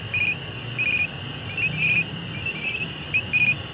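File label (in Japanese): matsumushi; matumusi; zukan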